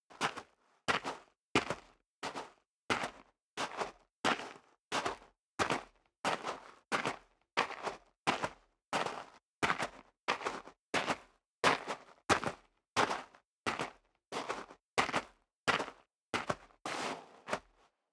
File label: step sneaker gravel light walk sequence; schritte